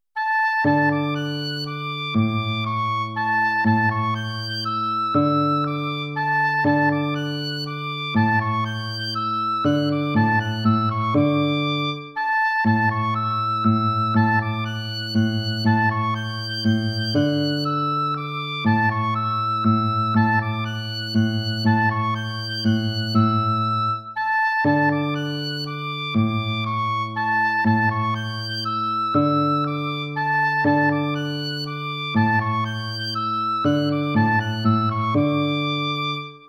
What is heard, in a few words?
waltz; Carulli Ferdinando